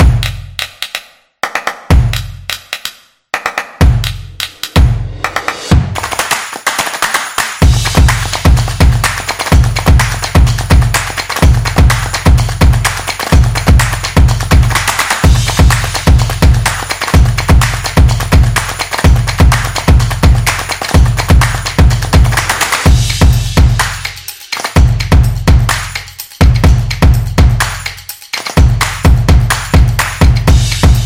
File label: A Percussion Action